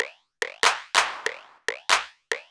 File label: 808 Loop SD 95, Roland 808 Drum Loops, 95bpm